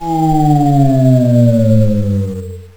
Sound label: stairs; Castle of Xhentars